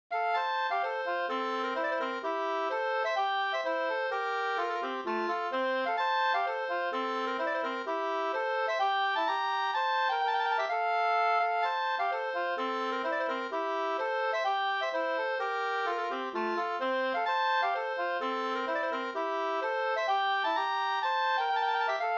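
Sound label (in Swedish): polska Bodapolska, F major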